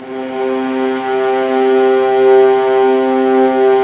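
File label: violas, ambience, piano; String Master Series; Vlas Sect3-4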